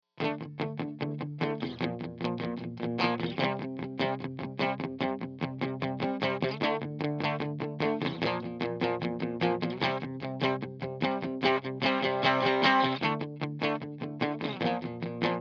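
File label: distortion guitar dry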